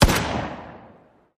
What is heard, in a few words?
Gun Sound; Gangstar; Gully Loops